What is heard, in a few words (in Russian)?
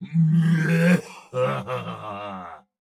Смех; Турбосвин